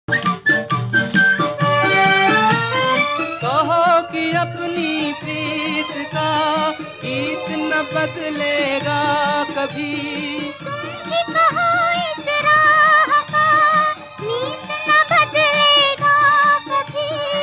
Instrumental BGM ringtone

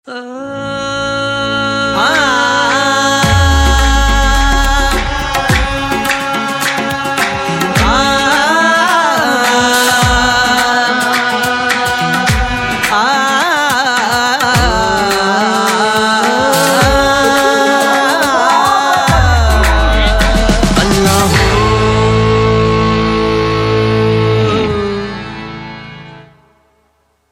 BGM ringtone

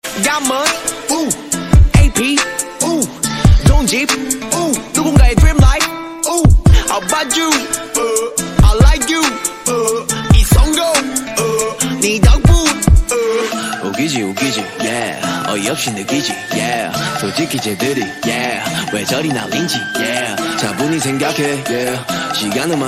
korean ringtone

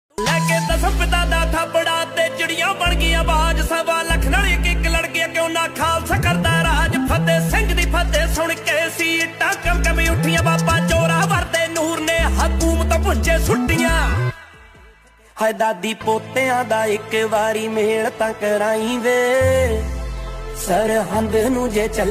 punjabi ringtone

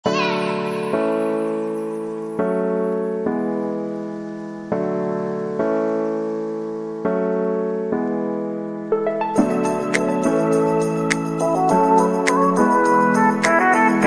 Instrumental ringtone

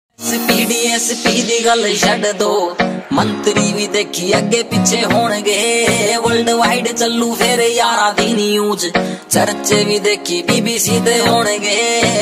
haryanvi ringtone

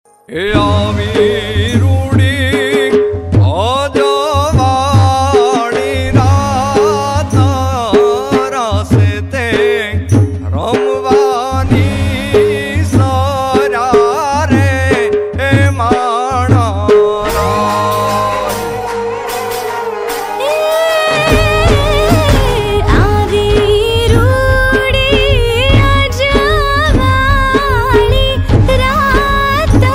ringtone

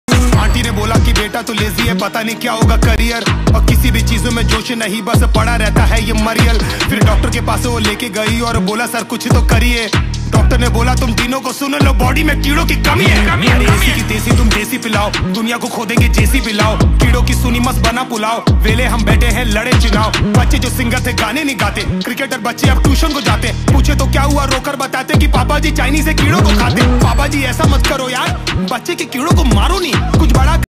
hindi rap ringtone

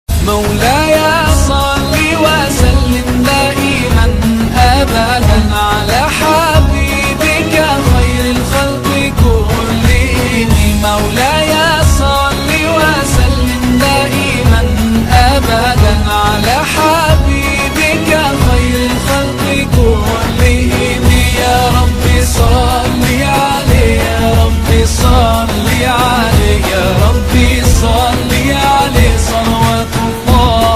arabic ringtone

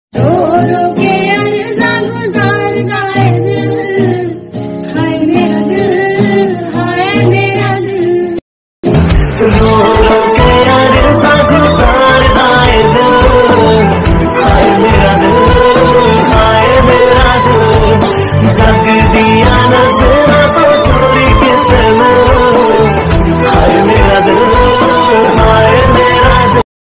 remix punjabi ringtone

